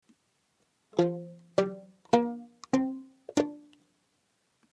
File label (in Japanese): wagomu karinba